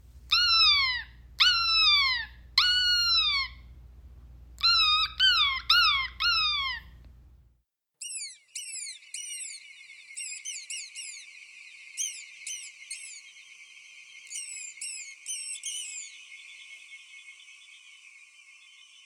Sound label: distressed bird; animal filters; Oak; New Play “Oak” by Terry Guest, directed by Mikael Burke